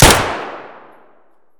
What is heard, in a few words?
gyrza indoor close; Escape from Tarkov